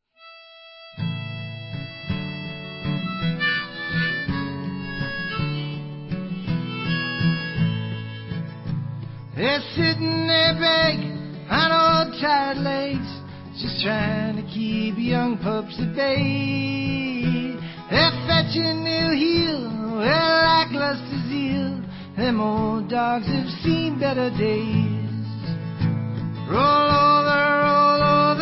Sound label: Acoustic